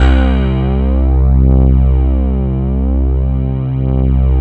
PAD DIG, Synth Pads; SYN-PADs; Synth-Pads 4

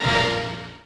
R50E hit; Crypton - DrumBase5000; RZ1

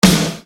Snare Dr

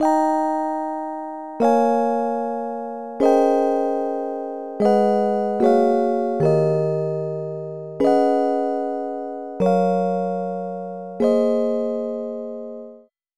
Keys Loop A BPM75

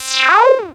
syn c, Dance Synths; ProSamples vol.37

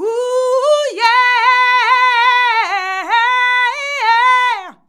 "HUUYEAHI", FEMALES; Best Service; Hyper Dance

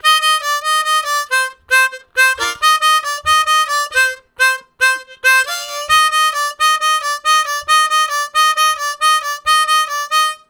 Bells, Harmonica; Zero G

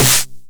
909 SD Tape Sat

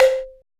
AFRO LOG DRUM; Heart of Africa

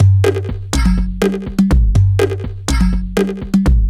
Top G 123bpm, House Drumloops; Zero-G House Fabrik Drumloops

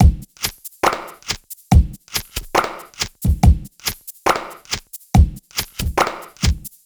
SloMo Beats, Loops 140 BPM

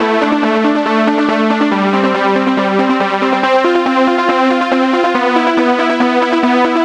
NRG Pad

